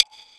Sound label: Wood, Block Stick; Equipped Music; Loungin House